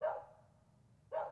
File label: dog bark distant; ambient; creatures; Counter-Strike Global Offensive